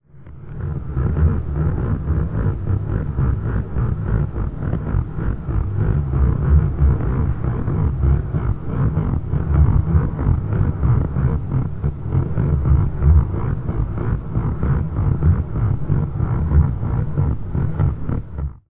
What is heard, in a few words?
sound design low rubbery squeaky